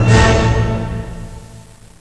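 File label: OCTAVE A, orchestra hits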